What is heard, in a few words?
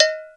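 Agogo, Roland S50 Percussion; Drum Samples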